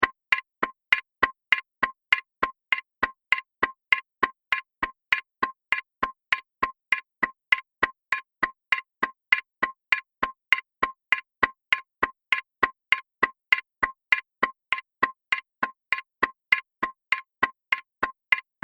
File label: Ticking Timer Seamless Loop; Cartoon Sound Design Sound Effects